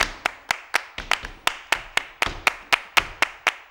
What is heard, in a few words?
CLAP + STEP, MCLAPS+STEPS; Best Service; World Colours